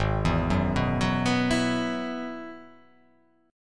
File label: G sharp Minor 7th Sharp 5th G sharp; G#m7#5 arpeggiated; 4,7,4,4,6,6,6,x; JGuitar